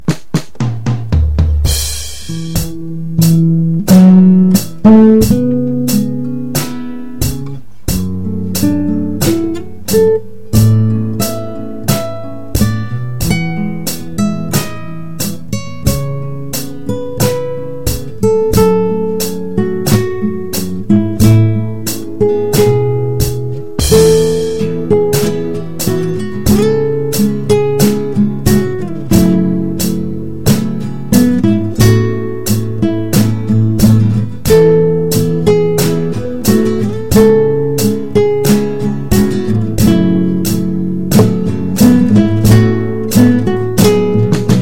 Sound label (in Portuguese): instrumental